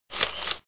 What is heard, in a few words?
Shot